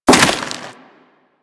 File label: Crewman Step Dirt, Footsteps; Warframe; Corpus